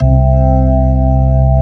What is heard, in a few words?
ORG, Organs; Sound & Vision; Gigapack I CD 2; ORG B-3 Organs